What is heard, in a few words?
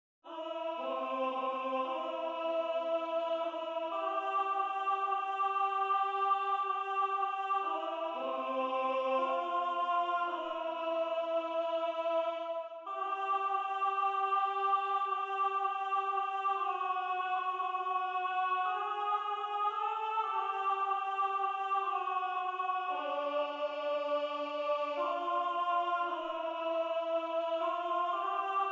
Odalssang Tenor